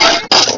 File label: car suspension; sfx; izanagi 2022